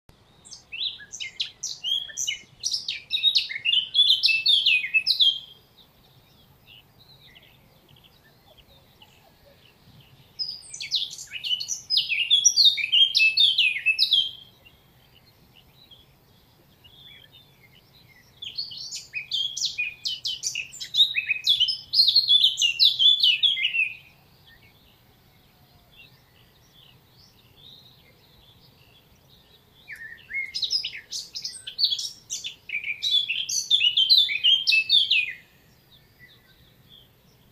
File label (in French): fauvette tete noire